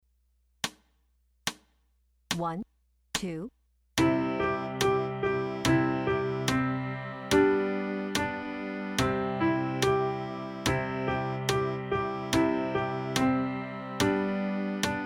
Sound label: Czech Folk Song